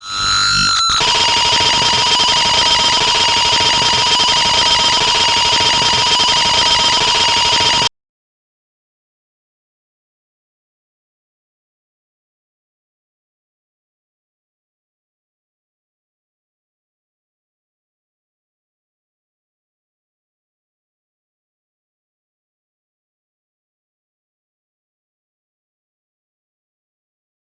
Guitars

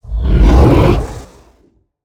Monster; Zenless Zone Zero

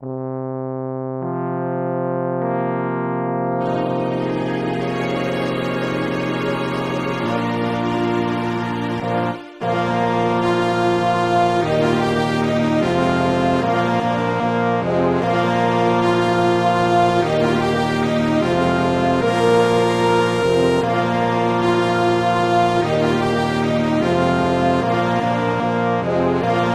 Fanfare; Inspirations of Friendship

